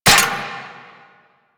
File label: RPG Mech; Hellion Elite